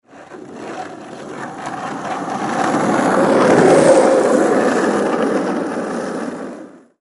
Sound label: Skateboard Alert